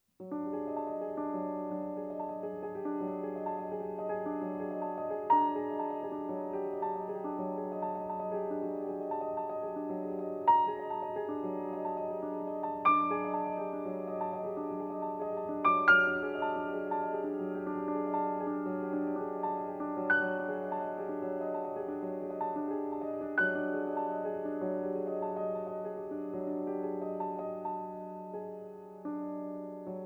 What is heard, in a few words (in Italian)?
Pianoforte